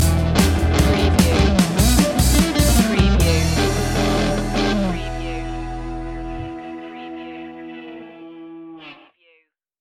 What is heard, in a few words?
bikers blues stinger